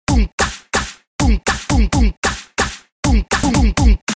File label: beats